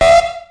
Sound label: klaxon single